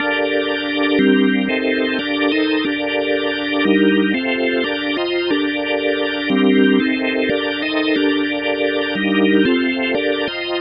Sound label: Theatre Organ; Sonic Foundry (Sony Creative Software) - Club Joints and Street Anthems; Time Traveller